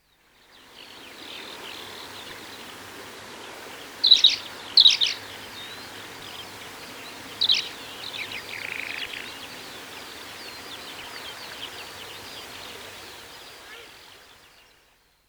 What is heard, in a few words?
bird; Zomboid 41.78.16; Project Zomboid